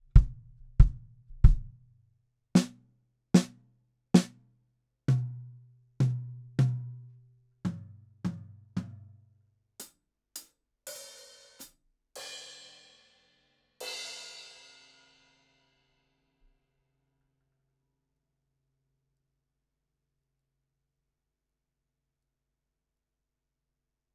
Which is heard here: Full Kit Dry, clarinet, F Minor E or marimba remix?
Full Kit Dry